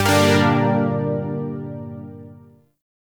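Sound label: Shawzin Minor Chord H; Zariman Shawzin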